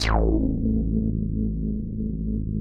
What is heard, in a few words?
DEEP BASS; Masterbits; Dance Trax